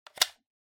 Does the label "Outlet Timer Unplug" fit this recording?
Yes